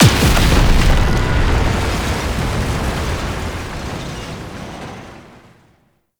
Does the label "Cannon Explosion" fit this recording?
Yes